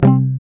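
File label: GUI C, acoustic guitar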